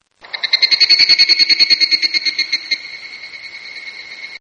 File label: CICADA; Insects; Sound Effects:Animals; Partners In Rhyme